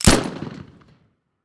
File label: tools gun; teardown; ps5 windows xbox series xs